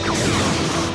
Ship Weapon; trek

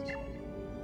Hail; trek

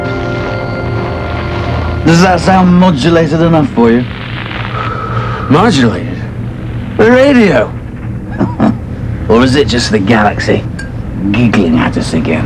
Giggling; Galaxy; trek

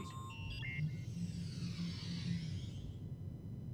Replicator; trek

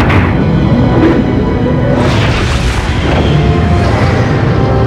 Shuttle Drop & Fly; trek

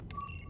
Comm Answer; Trek

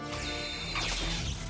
phasers; Bajoran; Trek

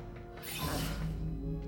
Forcefield Stop; trek

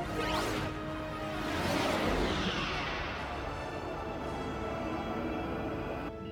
Tractor Beam; trek

